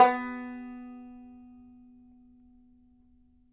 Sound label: banjo B3, banjo notes; Sampleheads - Whole Lotta Country 1 & 2